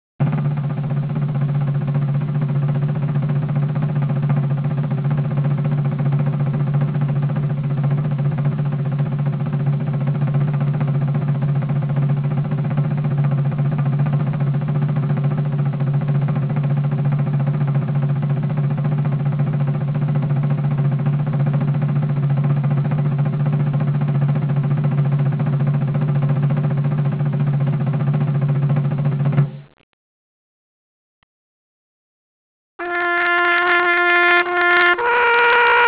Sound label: taps with drum